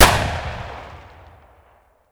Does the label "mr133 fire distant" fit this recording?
Yes